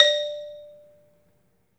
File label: MARIMBA; 3D Sound; 3D Keys VOL-1